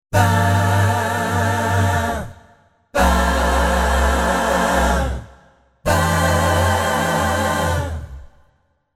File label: Vibrajazz; Spectrasonics Vocal Planet; Jazz Group Multisamples